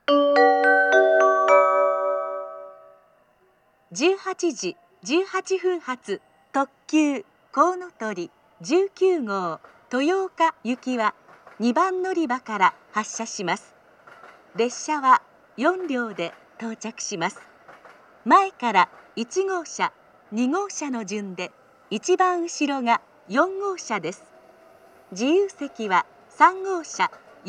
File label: amagasaki limited express, station; toyooka; jr-west; kounotori19